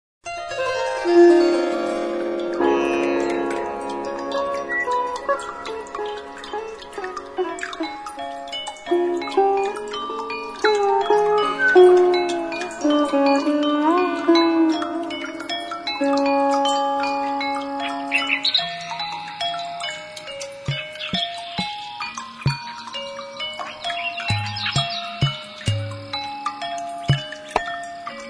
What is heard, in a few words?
bali nature spa